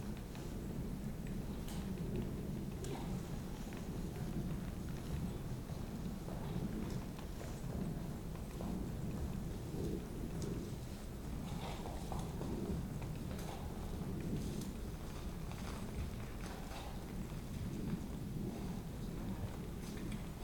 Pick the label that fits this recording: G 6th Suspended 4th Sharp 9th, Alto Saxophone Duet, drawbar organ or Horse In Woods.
Horse In Woods